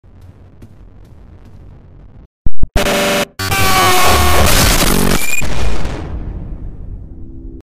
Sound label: jump scare; a-90